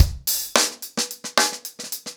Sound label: Hip Hop 110BPM, acoustic drums drumloops